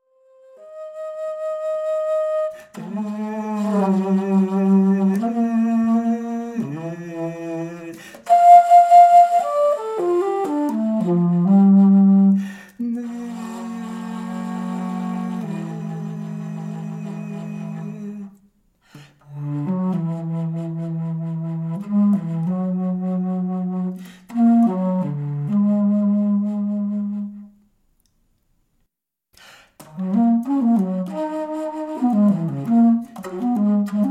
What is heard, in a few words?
Bass Flute Finale